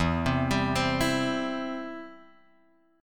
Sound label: E Minor Sharp 5th E down Guitar Drop D